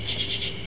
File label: crickets